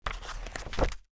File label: Book cover close